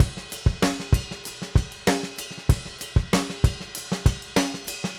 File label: Drums, 70s Analog Funk; Sonic Foundry (Sony Creative Software); What it Is!; Slinky's Place